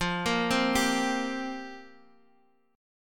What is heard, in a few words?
F Suspended 2nd Suspended 4th, down Guitar Drop D